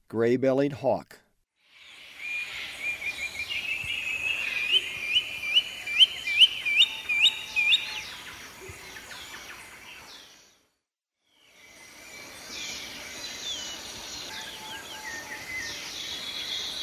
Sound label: Gray-bellied Hawk, Birds of Ecuador; S. America